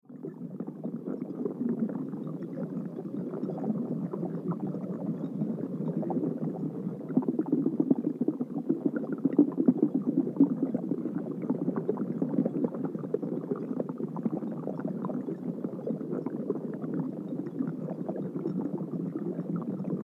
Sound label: Bubbling water